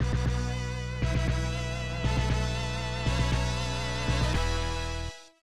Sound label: Bonus Round Completed; Moto X; SNES